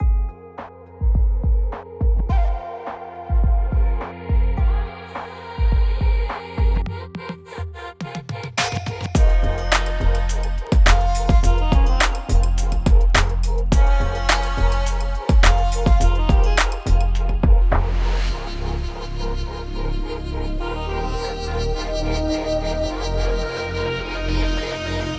ethno disco

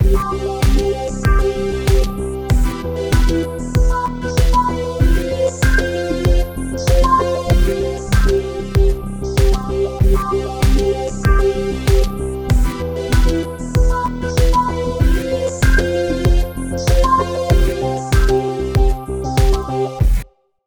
i warble loop

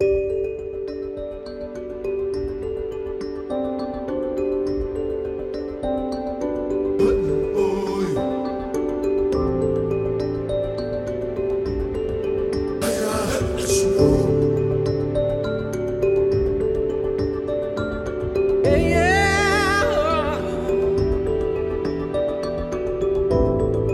dark kalimba heart of africa

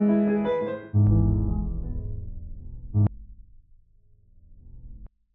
legendary grand piano keys

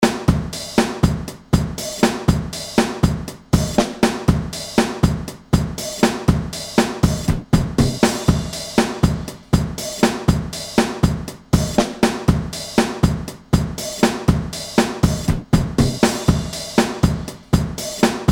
Yamaha 9000 tape drum rack

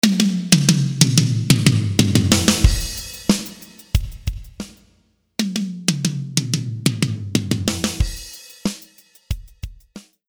drum fill large roo

